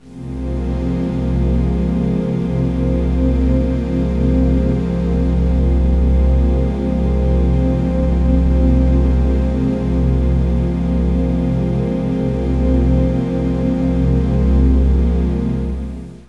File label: STRINGS DRONE; KillerTweaksII; DirectWave